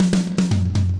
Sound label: 120 tom intro, drum loops